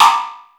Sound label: El percu, Yamaha Motif ES, electronic; electronic & zap; Yamaha Motif ES-Series